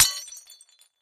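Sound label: Dish Ceramic Bowl Crash, housewares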